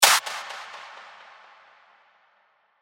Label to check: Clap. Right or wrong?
Right